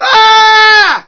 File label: Civilians ahhh; 1997 MMX LAN PACK (win 95); Army Men